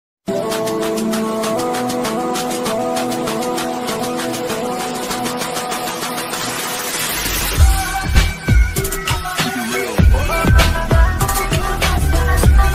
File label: hacker ringtone attitude ringtone english ringtone